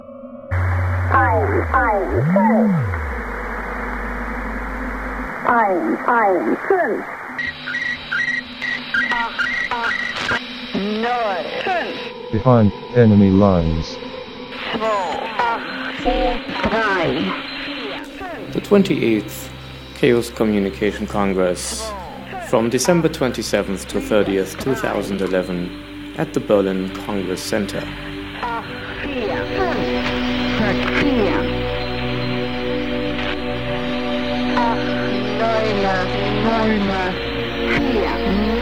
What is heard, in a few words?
jingle